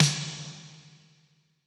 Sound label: snare; pasific; Pop